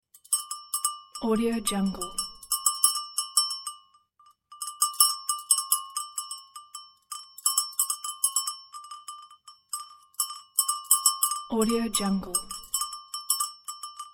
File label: cowbell on cow